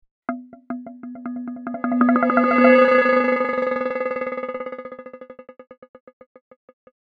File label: resonant plank granulation; Combination of Audio 8 and Audio 9 to create a palindrome; Spectralism and Microsound